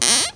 CREAK

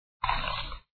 sirup

